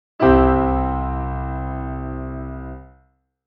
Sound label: C chord